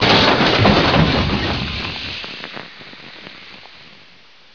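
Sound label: soda explosion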